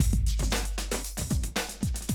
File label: Classic Drum Loops